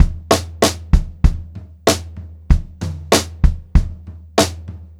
Pop-Rock Drumloops; 96 POP 3

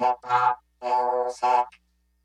VOCODER FX, VOCAL BITES; Kid Nepro - Elite

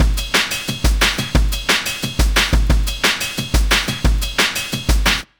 breakcore; No u turn

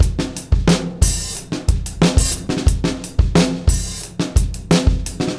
089, Beat Loops; Zero-G - Monster Beats

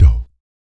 PERC, Vocal, Jazz FX, BASS PERC; Spectrasonics Vocal Planet

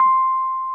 RHODES C5; Sonic Reality - Vintage Timetraveler

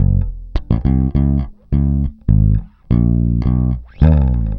Pop, Bass

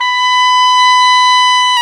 oboe, Oboe Brth; Roland LCDP04 Orchestral Winds; Oboe Brth 1

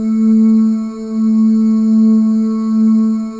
BGV OO

